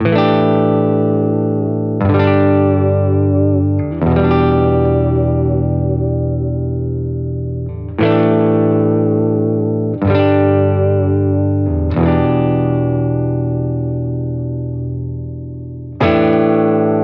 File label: In Out Close Far; healing soundscapes